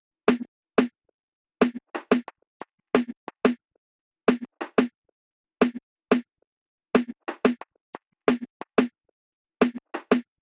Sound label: 90 Dmin Snare